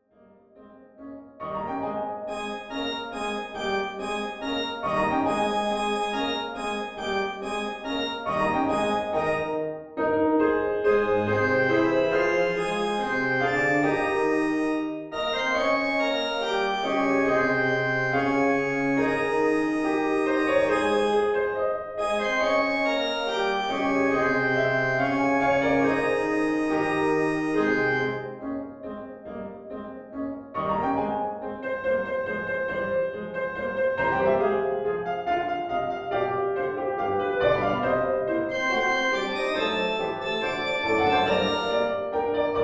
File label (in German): Klavier Mezzo; Zauberfloete; Zu Hilfe zu Hilfe sonst bin ich verloren